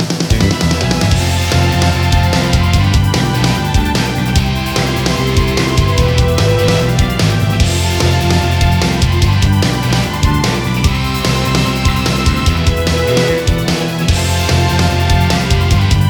battle loop long intro shift low

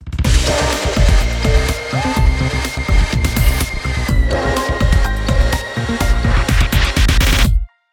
Start Jingle; christmas

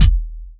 kick

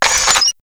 guns gun player type

